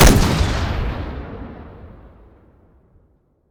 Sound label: shoot last; tacz; daffas arsenal